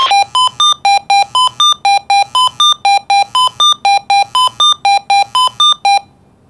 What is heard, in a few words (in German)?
Einzelruf